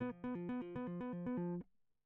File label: electric